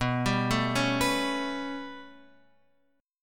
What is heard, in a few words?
B 7th Suspended 2nd Sharp 5th down Guitar Open F, chord; B7sus2#5 arpeggiated; JGuitar